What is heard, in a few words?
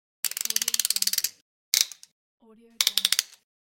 utility knife